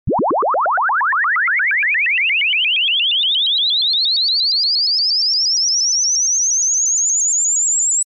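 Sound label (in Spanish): Estímulo 50Hz-8000Hz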